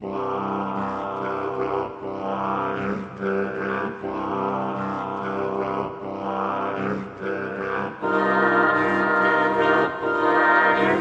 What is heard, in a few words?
sad ost